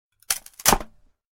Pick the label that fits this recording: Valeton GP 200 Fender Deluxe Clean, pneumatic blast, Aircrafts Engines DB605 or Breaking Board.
Breaking Board